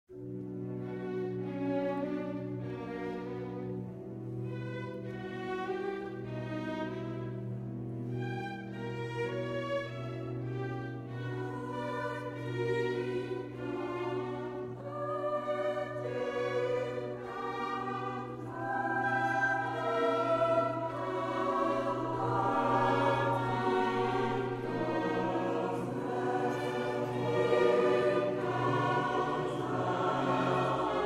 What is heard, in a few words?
Mass in B Minor, Et Incarnatus Est; BWV 232 No. 16